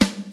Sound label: Thick Snare Single Hit G Key, snare drum tuned to the G note; snare drum samples